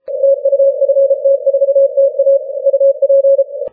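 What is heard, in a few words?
12m continuous wave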